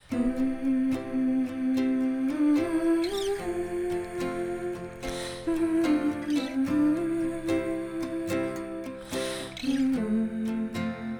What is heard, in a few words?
Instrumental, Uke; Greenwich